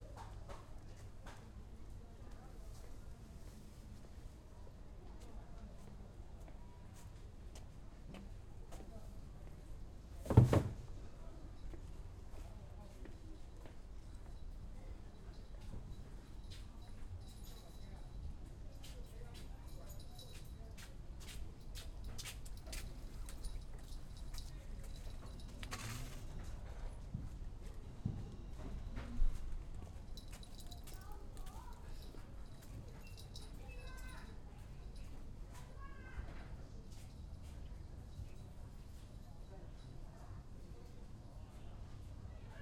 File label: SHANGHAI lane day off-street close movement footsteps electric scooter water splash muffle; muffled voices chinese doors horns; Sun-Smile-Island; Shanghai Ambiences